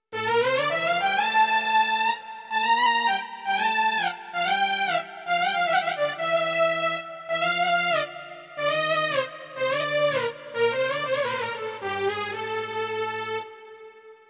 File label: string slow, melody